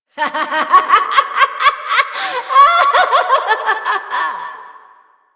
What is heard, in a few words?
Laughter; Warframe; Entrati; Evil Twin